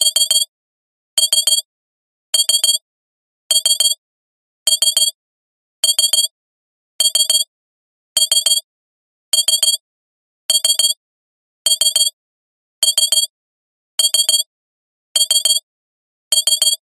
science fiction alarm beeps glassy digital 3 cluster slow